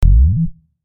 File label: magical; maoudamashii